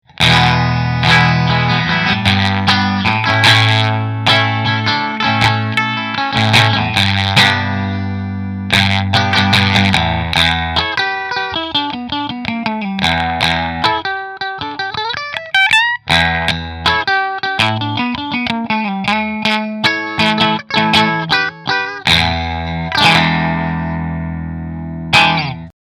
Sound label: Rahan Ambrosia Position 4 Fender; Rahan Guitars RP Single Cutaway Ambrosia